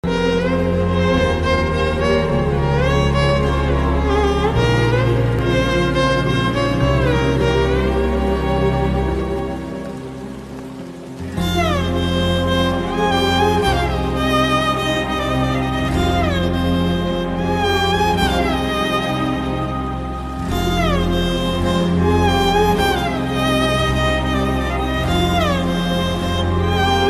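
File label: love failure background music ringtone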